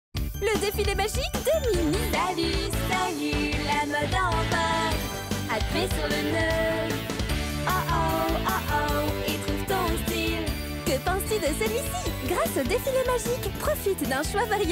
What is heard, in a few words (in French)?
Publicite TV chantee Voix Off femme; Le défilé magique de Minnie